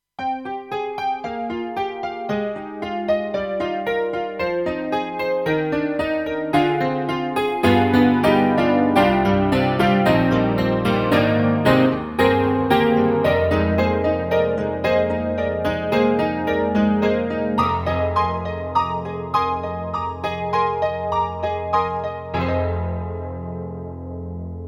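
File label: hammer piano, KORG 03RW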